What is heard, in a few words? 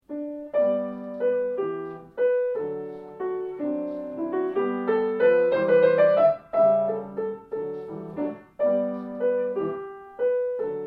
Trio